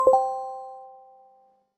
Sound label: notification